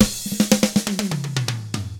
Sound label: 116 ROCK, Double Platinum Drums; ILIO